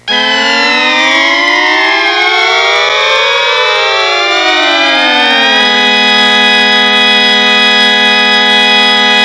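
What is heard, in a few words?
church organ; Sound Library 2000; Wayzata Technology; Music In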